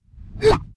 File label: swordman attack; megaglest